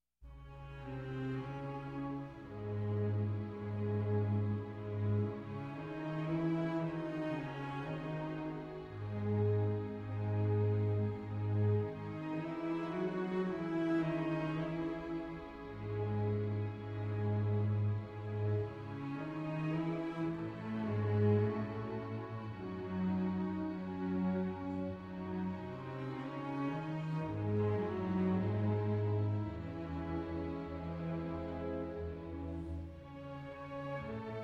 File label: violin concerto; in E major, BWV 1042 - Adagio